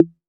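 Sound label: 808 snare drum; Electronic; Wizoo - Platinum 24 Electronic; CHR SD 808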